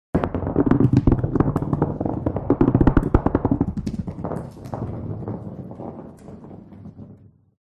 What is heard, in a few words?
ball stone rolling away on concrete; Sound Ex Machina